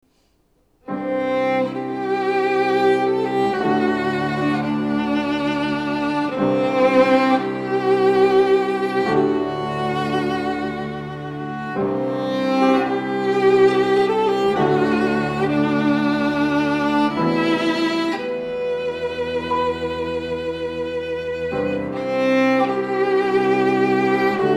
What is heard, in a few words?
trio